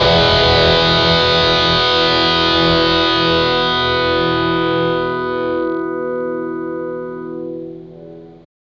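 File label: GUI MET2 E3, metal guitar; Sound & Vision; Gigapack I CD 2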